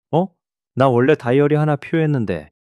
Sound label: surprise